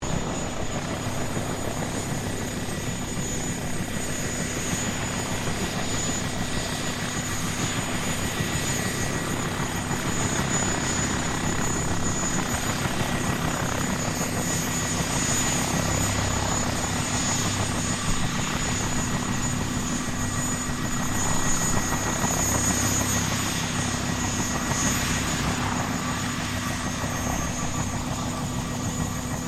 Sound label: HELICOPTER BELL UH1 IROQUIOS WARMUP; RAN UH-1 Iroguios; Helicopters (Sound Effects:Vehicles)